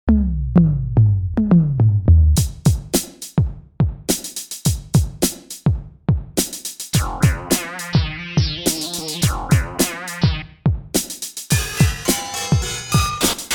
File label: Garage Loop